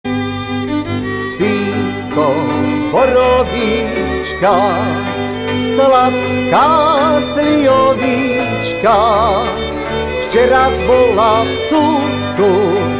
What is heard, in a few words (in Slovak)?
pesničky